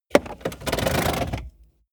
car bmw e46 interior seat pull forward completely rattle